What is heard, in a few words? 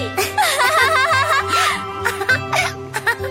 ahahahaha hahahaha